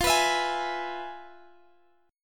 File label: F Minor Major 7th Flat 5th; FmM7b5; strummed; x,x,15,16,17,16